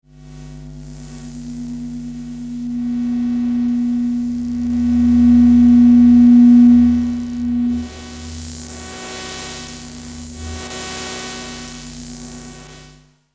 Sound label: Multiple lamps and cables flowing energy textures